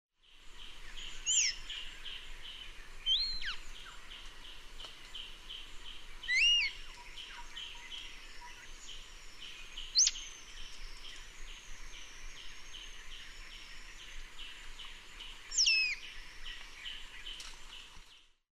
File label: Black saw wing; پرنده اره بال سیاه